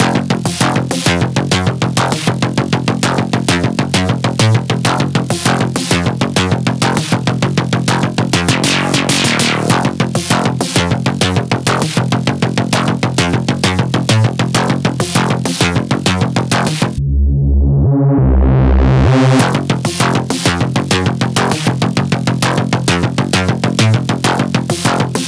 99 BPM F Min Bass; Cyber Collection; Vengeance

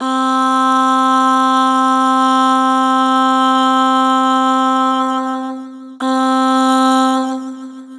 "Aah", Harmor Vocals

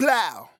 Dry Chant "Blauw"; Cymatics; Euphoria Vocal Sample Pack; Chants

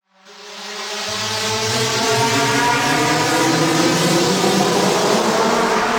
Murkiness Riser FX, Dubstep; Ghosthack - AC2024 - Day 13 - Dubstep Sounds 2025; Effects

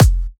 Kick, dubstep; One-Shots; Drums; Frost Kick

